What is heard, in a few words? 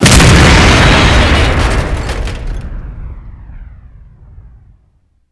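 explosion metal armor distant; modern warfare 2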